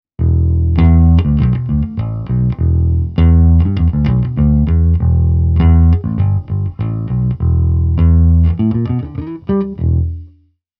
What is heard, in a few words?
Fender Preci